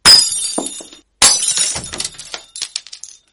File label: Glass breaking sound message